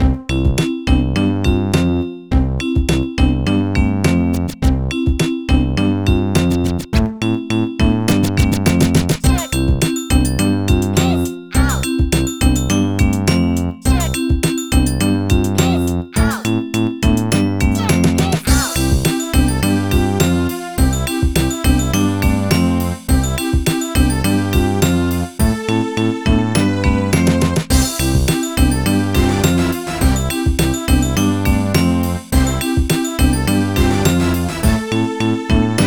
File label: Music GARDEN BPM100; Super Scribblenauts 2010 NDS